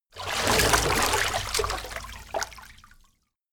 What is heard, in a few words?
moving